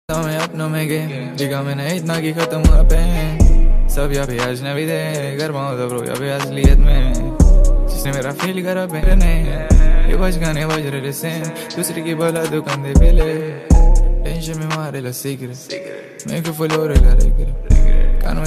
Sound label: ringtone instrumental